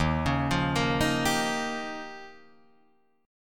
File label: Eb 9th, Guitar, Drop D